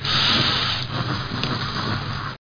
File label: motion sound